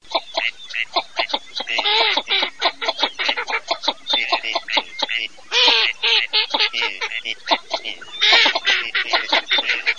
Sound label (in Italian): CANAPIGLIA MESTOLONE CANAP mest